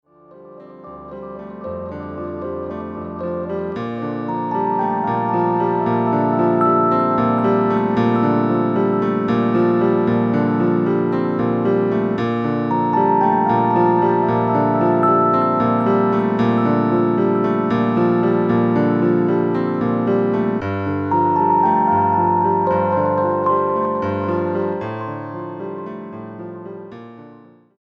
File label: segment of piano with rhodes